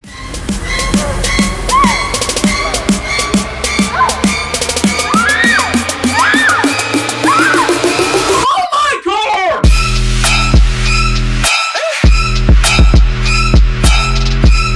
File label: halloween trap remix